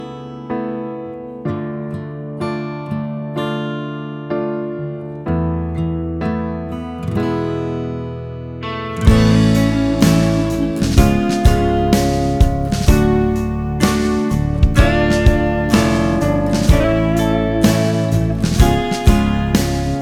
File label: Instrumental